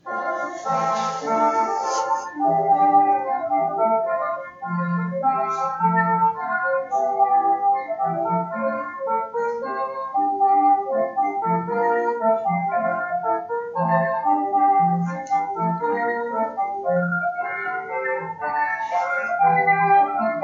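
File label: folk instrumental, Israeli Dances; cherkessiya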